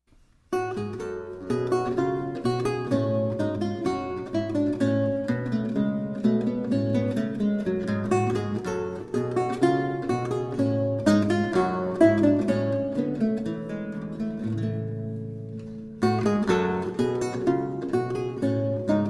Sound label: Bouree, Lute; BWV 996; Johann Sebastian Bach; JS Bach on the Lute